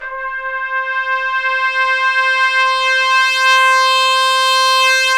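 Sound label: BRS TP SWE, Trumpets Swells; Roland L-CD702; Stabs-Swells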